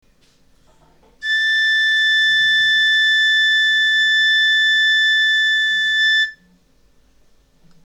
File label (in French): 440 sib, galoubet; Note Sib - 3ème ligne